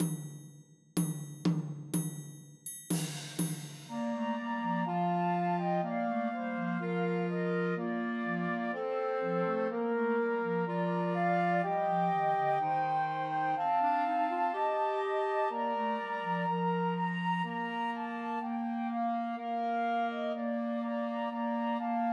Joy to the World Christmas Trios for Two Flutes and Clarinet